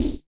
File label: Drums; Distored Reality